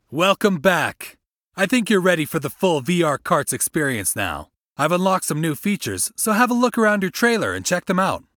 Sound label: Race Complete; VR Karts